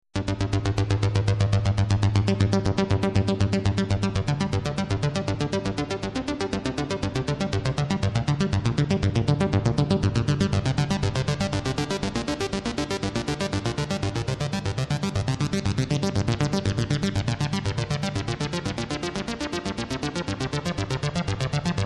Harp

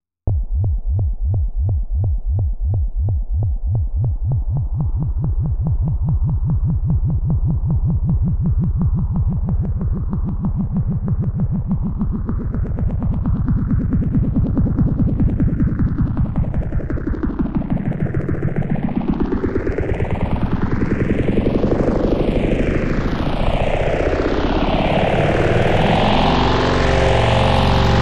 Copter

